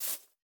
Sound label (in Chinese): 竹笋击打声